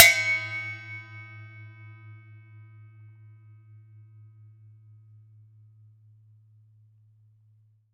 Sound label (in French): couvercle; 96khz 24b mono; In the kitchen